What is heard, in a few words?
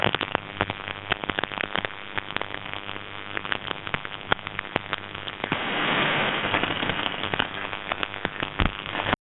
high frequency digital bi; glitch